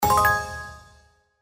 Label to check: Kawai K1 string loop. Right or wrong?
Wrong